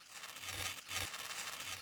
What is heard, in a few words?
tearing; DimDoors